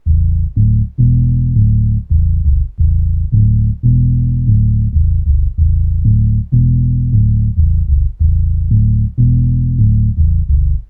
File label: low bass; OSCar